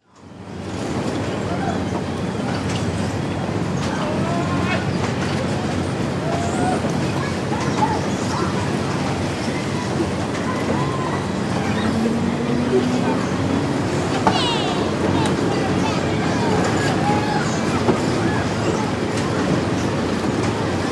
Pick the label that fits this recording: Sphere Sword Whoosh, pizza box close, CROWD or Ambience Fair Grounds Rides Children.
Ambience Fair Grounds Rides Children